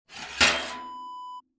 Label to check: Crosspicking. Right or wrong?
Wrong